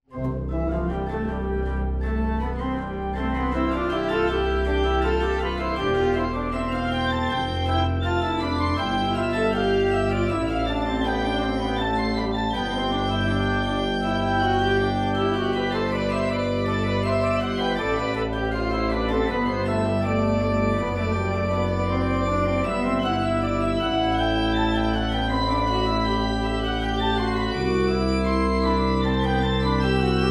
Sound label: Organ Prelude; berniescherrcomposer